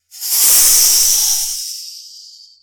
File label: icesnake; nssm